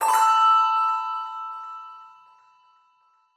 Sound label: Goal